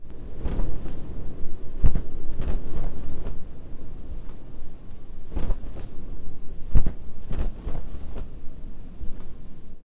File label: flag; jidaigeki